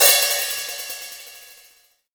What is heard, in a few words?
big room open high hat sound b key